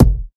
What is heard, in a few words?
clean kick single shot g key, bass drum single hit tuned to the G note